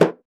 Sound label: Dof Sak, Percussion; Roland BK-5